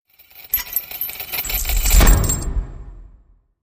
Desolate Playground Chain Hit

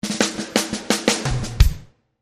Drum Fill; Drum Fill 1

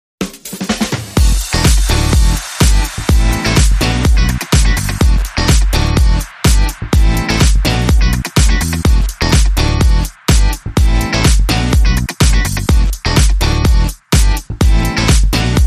Riffunk

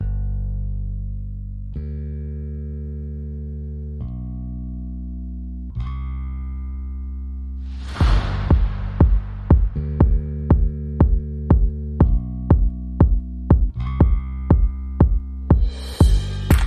Drums and Bass Only

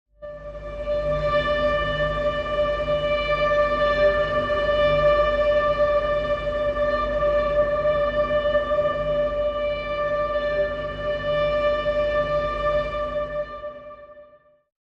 Faint Bowed High Pad

